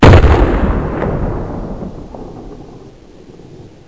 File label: blast, monsters, hellhound; svencoop; AoMDC